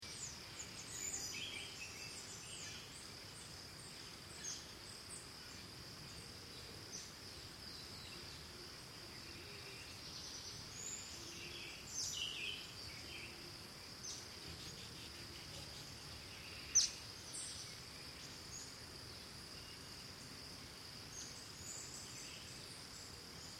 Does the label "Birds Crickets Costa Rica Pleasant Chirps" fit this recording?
Yes